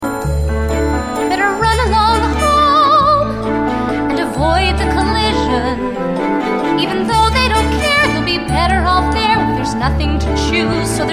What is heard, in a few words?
Guide Vocal